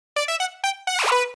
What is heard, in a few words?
Lead